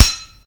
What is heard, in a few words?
Flesh Metal; opensim; CollisionSoundsAssetSet